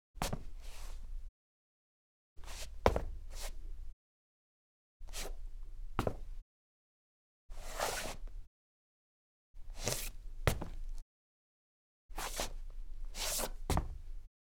Wood Hard Sneakers, Footsteps

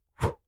Swish Fast; Whooshes

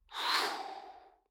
Human Whoosh; Human Whooshes

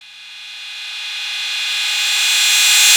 Riser

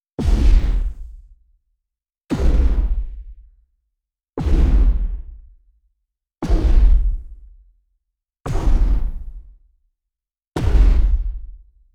Impact Thud Shoot

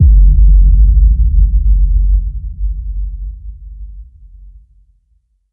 Tension Impact

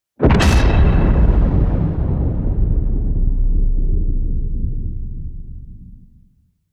Metal Slam

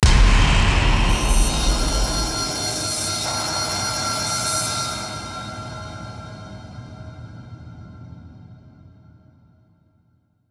Tension Builder Impact

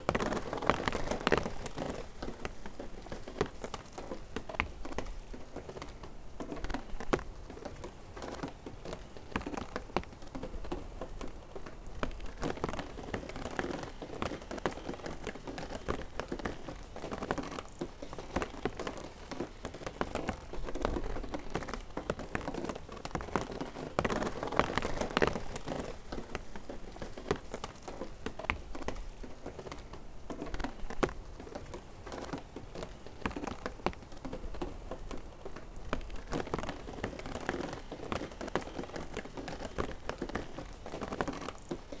Rain Low Hail

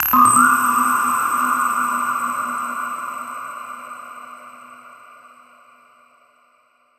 Ancient Sci Fi

